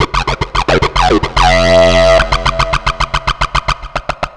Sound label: Techno Synth